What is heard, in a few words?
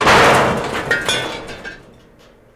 Jeep Crash Debris, car crashes